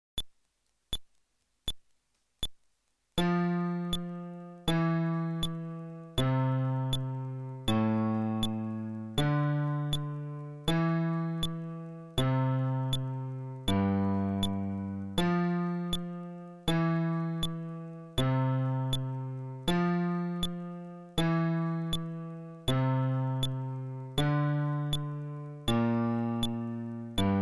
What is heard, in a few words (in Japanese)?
フレットレス (テンポ80)